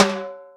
Timbale, Drum Machines Roland R-5

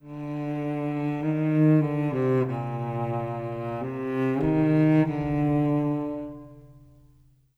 Cello, Orchestral; Sonic Foundry (Sony Creative Software) - Orchestral VOL-2; Modern 36

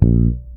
FRET MUTE A#1, PEDULLA BASS; Masterbits CLIMAX 7 - Bass

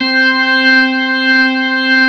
HAMMOND; ORGANS; Ragga & Dub Reggae

